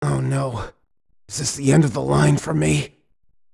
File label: death; tien